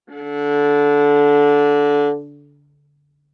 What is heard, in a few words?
Viola arco ff sul C D3 stereo; MIS Pitches - 2014; Strings